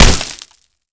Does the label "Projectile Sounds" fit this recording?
Yes